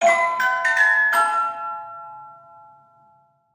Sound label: stinger loot box legendary; heroes of the storm full gamerip 2015